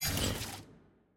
ui abilities intro

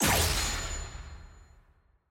ui gameplay end

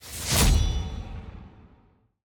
draft team bans locked; champ select

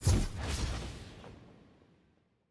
member banner intro; parties

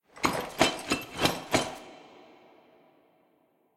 walk run

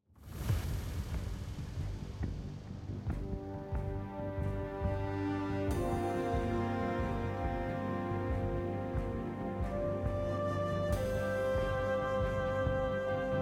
music inqueue loop; brawl

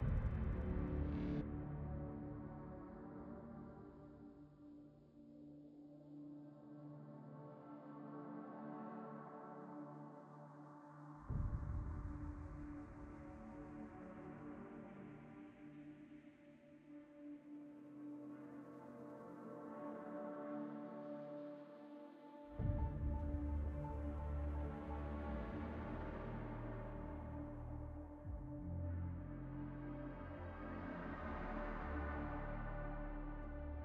mus client

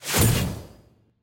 ranked ui lp decrease; lol postgame

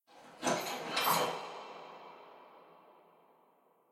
anim exit happy

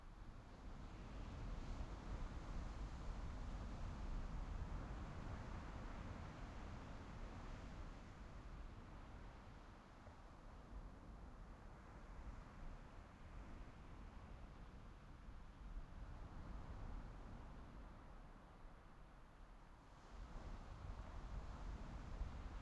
ambience loop; tft set9

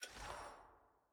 ui hover; caitlyn

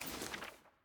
ui record next click; jinx

